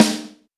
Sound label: SD BONUS, Drums